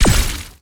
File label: dark staff eye